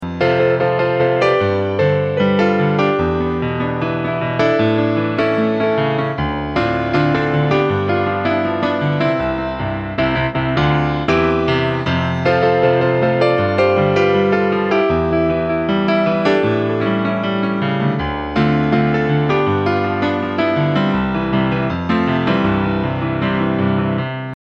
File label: piano